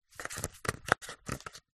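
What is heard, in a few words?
headphones handling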